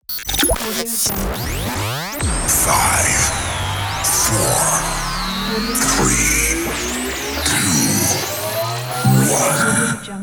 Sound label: countdown